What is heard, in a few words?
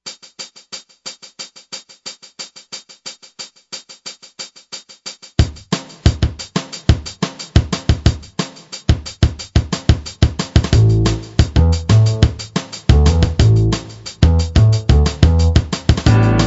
drum bass